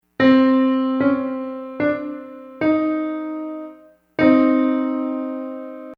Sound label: min 3 Halfs